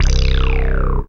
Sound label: FILTR BASS